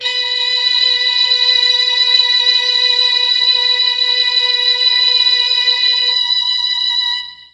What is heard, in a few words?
B3 STNG BRS, mellotron; STRGS+BRASS